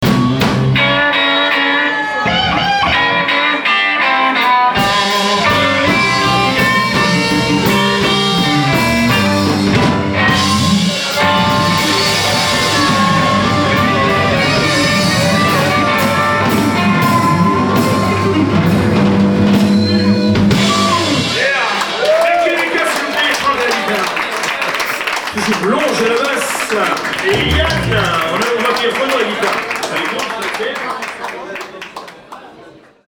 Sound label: blues